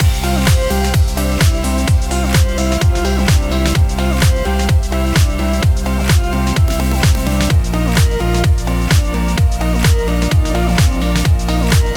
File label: BattleResult; JINGLE BattleWin; JINGLE BattleLose; Tabe-O-Ja